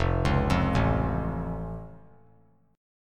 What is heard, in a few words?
F Augmented F; arpeggiated